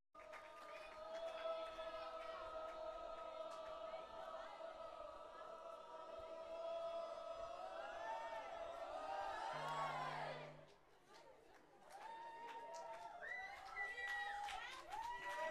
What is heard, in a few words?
Applause; NDog Arena